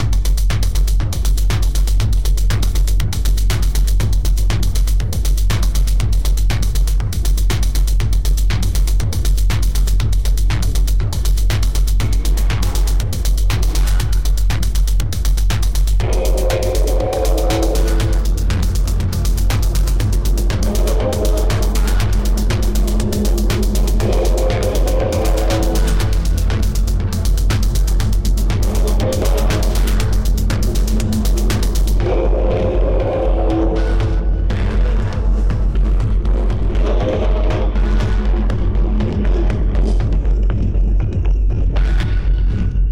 zombie trance dance music with drums 60bpm lots of tom tom